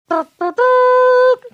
announcement mouth trumpet

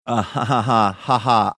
uh hahahahaha

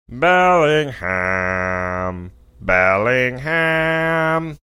singing bellingham bellingham

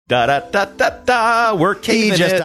singing mcdonalds jingle saying were caving it

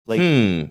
hmm

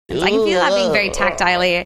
making weirded out sounds

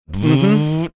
making airplane flying sounds

